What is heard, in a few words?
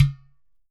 GHATAM